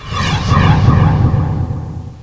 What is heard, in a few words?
force field; lmctf